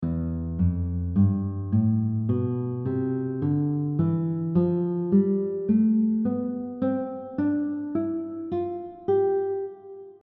C Major scale shape 1, guitar; For the notation below, the numbers above the notes suggest what fingers to use for your fretting hand

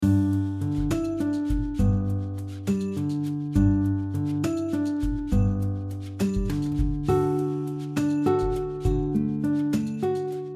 Silent Night guitar

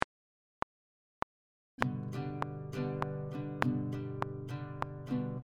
Easy strumming pattern, guitar; Strumming pattern 8; Strumming pattern 8 audio

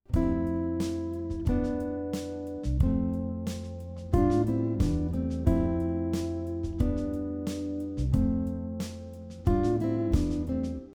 3rd below melody example, guitar; how to harmonize a melody on guitar using 3rds and 6ths

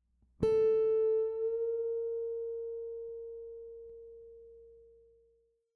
half step bend, guitar; guitar string bending

